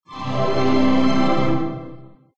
login success; There; ThereClient

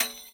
Tamb Chop; Drums; Tambourine

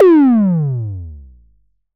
Tom Fat; Drums

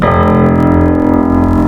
Instrument Piano; Ableton Live 12 Lite; Vector; Sprites

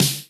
Snare Acoustic, drums; One Shots; Nightly

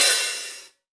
Hihat Open; Ableton Live 12 Lite; Core Library; Drums

